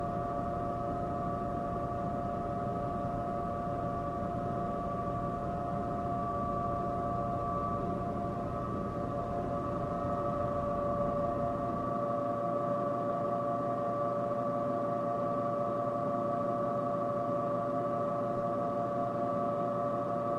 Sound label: Laundry Roomtone